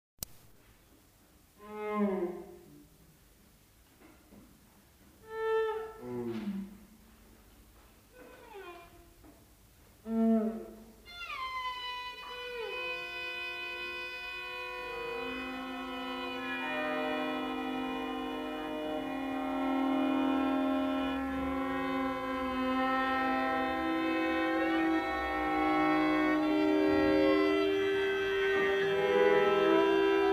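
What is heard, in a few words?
string quartet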